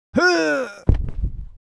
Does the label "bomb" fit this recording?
No